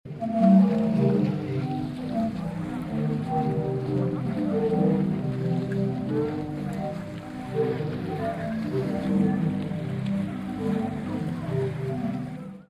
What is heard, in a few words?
sea organ outside; Zadar; 1918Johannes TrAndleZadarsea organ 1 outside